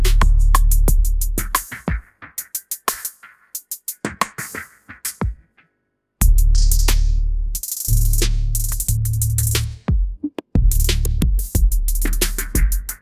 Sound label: empire entrance trap, ringtones